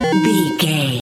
pleasant connection retro game sfx

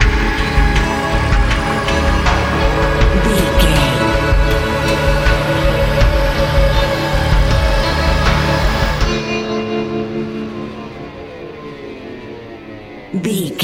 cold just ice stinger

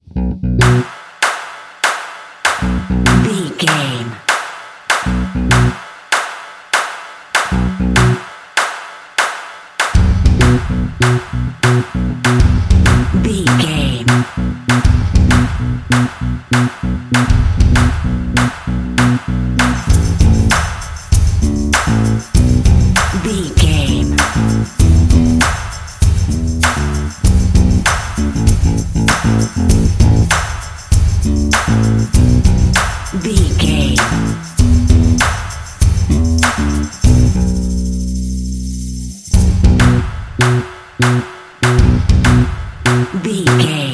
rock machine bass and drums

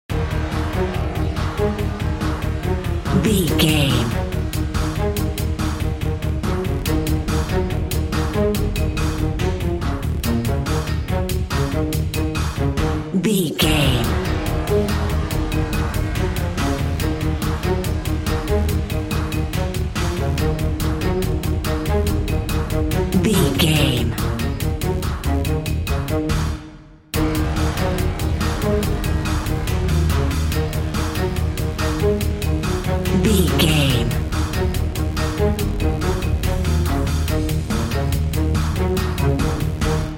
pumped big game no lead

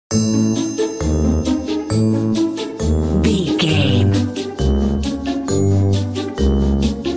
stealthy tiptoeing real loop

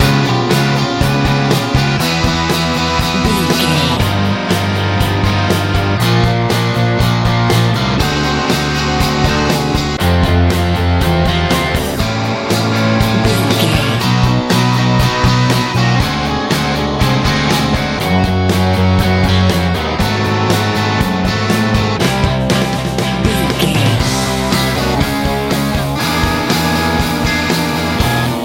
blooming nice 90s rock

